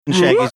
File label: making scooby doo like sound